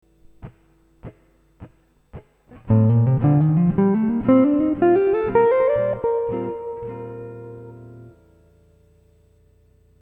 modern jazz guitar licks

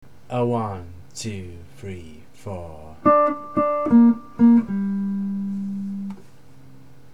minor 7th 3 9 arpeggio lick, guitar

